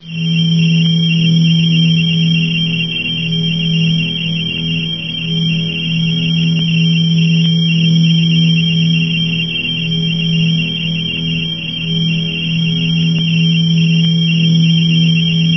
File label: radar; race-into-space